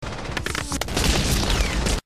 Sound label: bullet hit MG42 distant